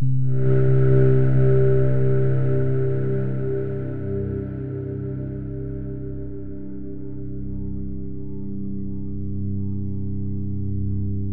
ambience; OneShot Fading Memory

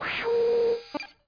sfx map zoom in

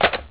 user offline

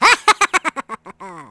hahahahahahahahaha; Rabbit-Wars

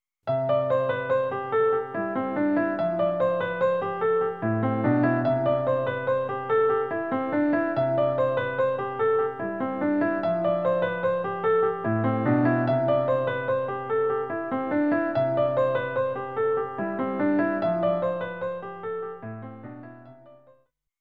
Airship BGM; NINTENDO

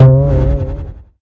trampoline

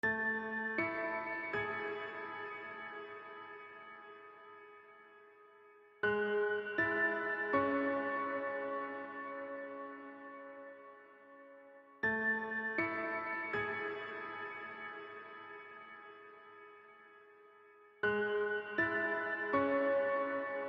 piano

